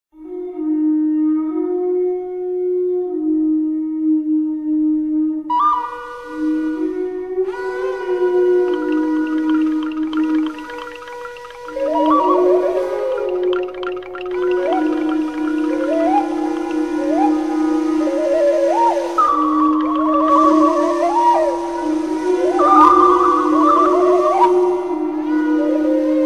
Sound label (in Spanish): trompas, silbatos